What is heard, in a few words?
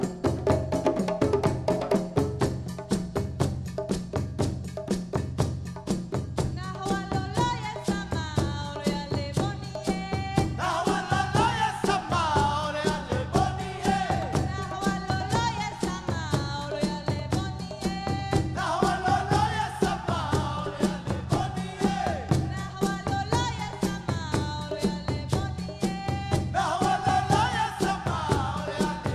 drum Song; Arabic; World Music